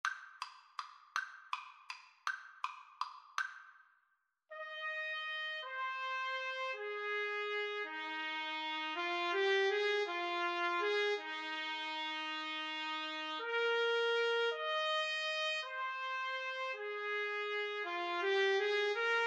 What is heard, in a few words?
trumpet duet